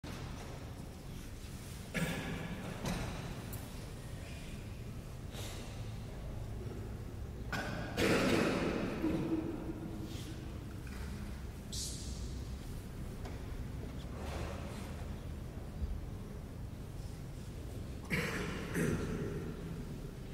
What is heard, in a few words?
prison cell ambience